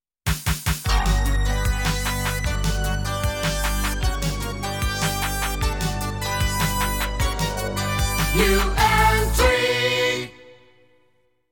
Chart New Entry

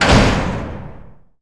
Door Jail Close; Doors

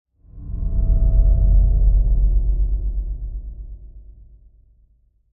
Drone Pad Mystical Enter; Hollywood Edge; sound designer's toolkit v2

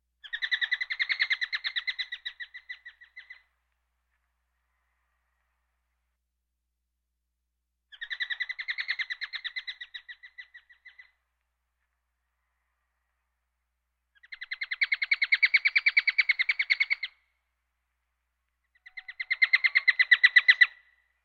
Birds

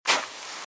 Footsteps - Sand - Dry - Walk - Left

